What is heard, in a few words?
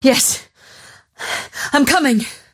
move pain; dota; mirana